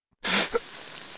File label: pl burn pain, player